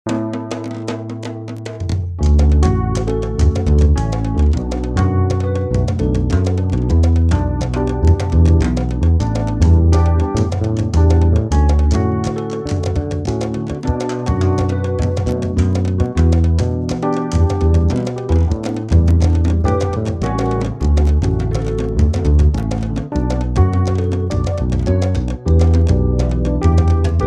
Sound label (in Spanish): Empieza el dia. Pista instrumental sin melodia